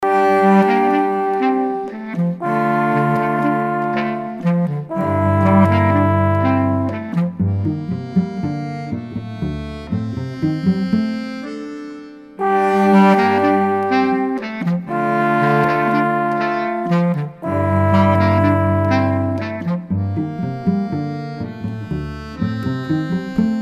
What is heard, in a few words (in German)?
zwischenmusik